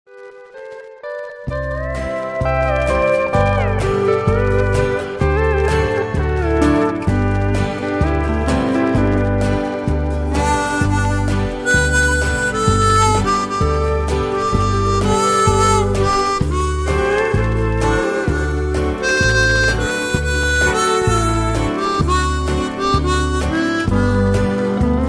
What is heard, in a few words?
Two Step Instrumental